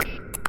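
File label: shutter click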